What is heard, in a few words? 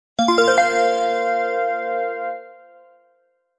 bell